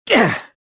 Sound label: gasp; luke